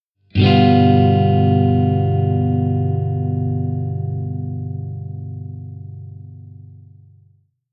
Acorde de Tetrade de G7

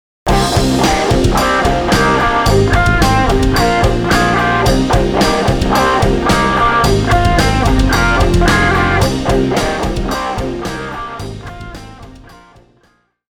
Riff com excesso de medios

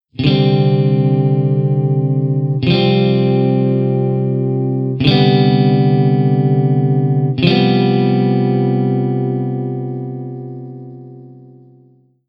Campo Maior Acordes C2 C4 C27M C47M

